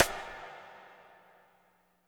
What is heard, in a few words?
AMB RIM R, WARM BALLAD; Spectrasonics; Burning Grooves CD2